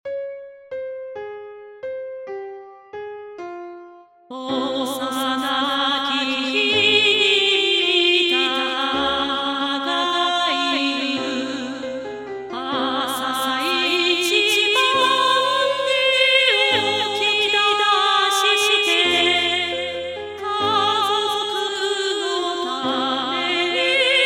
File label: Song